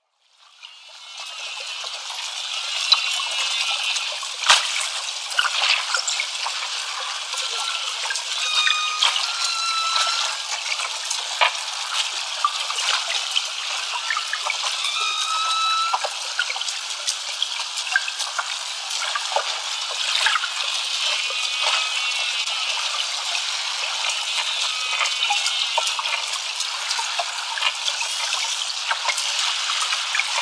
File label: Humpback Dolphins